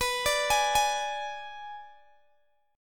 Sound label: B-Minor 6th B down Guitar Open G